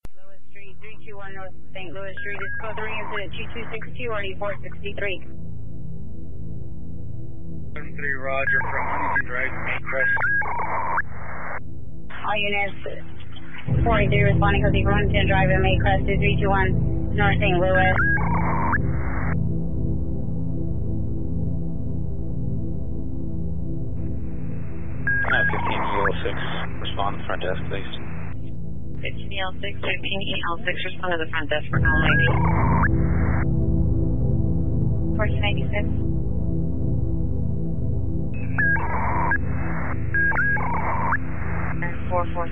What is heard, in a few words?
Shortwave Symphony